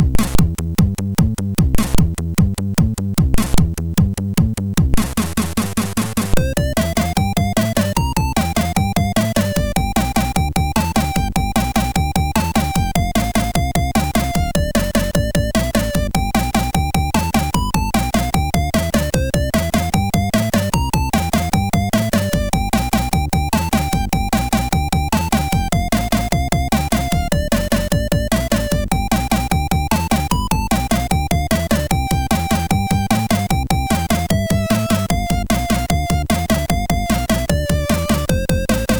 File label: Rock and Roll, MOS6581R4